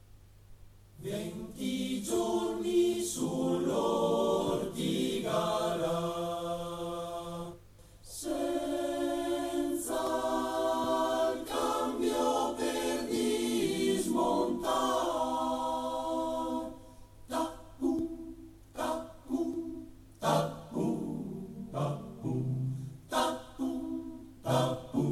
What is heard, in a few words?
Saba Freiburg Studio A Coro; Coro Brigata Alpina Julia; Tapum